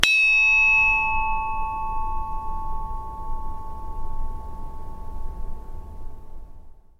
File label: chinese bronze bell front; sackler